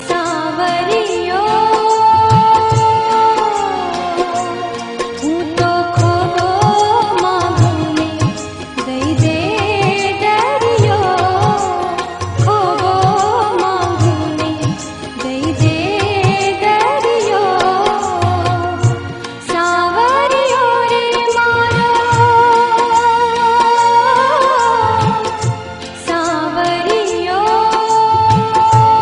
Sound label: Gujarati Tone